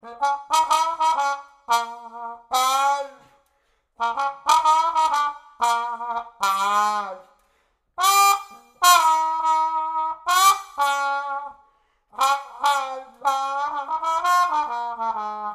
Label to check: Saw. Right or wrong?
Wrong